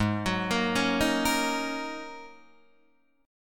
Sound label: Ab 7th Suspended 2nd Suspended 4th, Guitar, DADGAD